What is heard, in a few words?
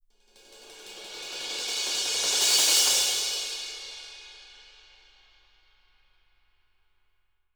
CYMBALS; Sampleheads; Peter Erskine Living Drums; SD CYMBALS